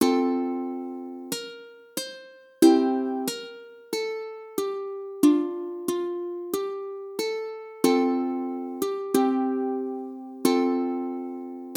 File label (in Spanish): Himno de la alegria ukelele